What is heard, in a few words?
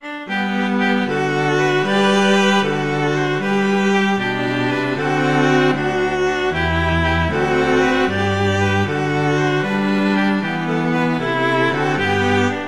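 I Saw Three Ships, Christmas flute quartet; Christmas String Trio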